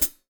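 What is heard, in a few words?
open hi hat, Real Drum Kit; Best Service ProSamples vol.43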